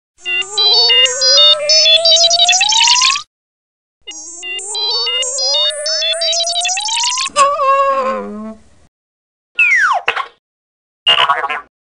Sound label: r2-d2 sound effects